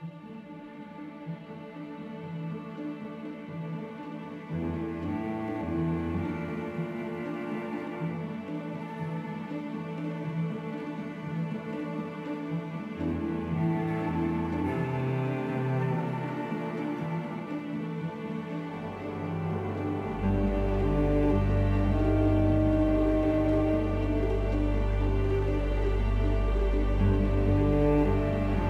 Forest Enemy Camp; Order and Chaos; Envir High Forest Enemy Camp

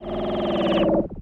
Teleporter Fade In

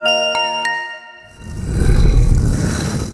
Dice Win; Dark Dimensions: City of Fog

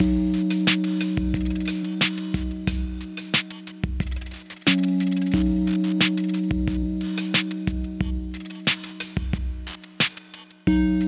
FOREST; FOREST 1 (B) LOOP; Poncho; PS4; Wii U

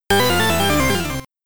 Death (SCC); Knightmare; MSX; Popolon's Death

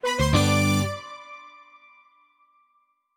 Collection Sting Short

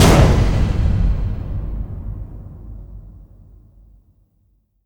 Fail

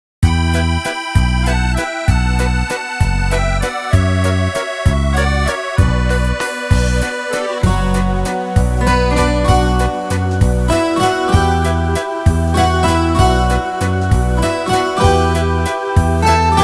Juke Box Waltz

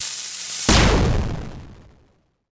Rowboat Victory Overlay; Plunder Panic

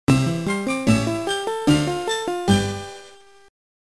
Excited; Cabbage Patch Kids: The Puppy Patch Rescue; GBA

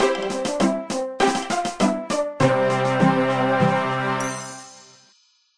Woodcutting level up; Old School RuneScape

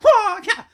rage

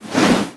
whoosh air long; Scrat

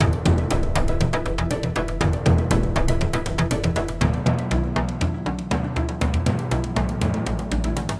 sounds music; Halo Combat Evolved; Xbox

